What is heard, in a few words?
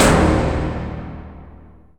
FM HIT; E-MU Formula 4000 Series Vol. 2